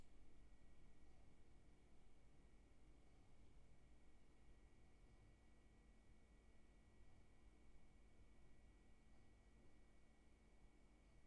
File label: roomtone